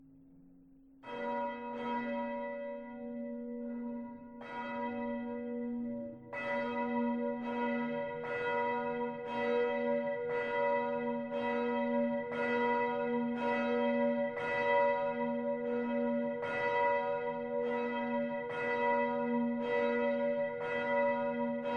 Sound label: bell south tower petruscanisius